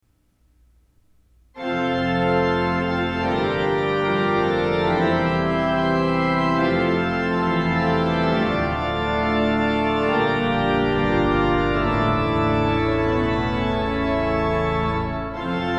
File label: Fanfare; Bergen DK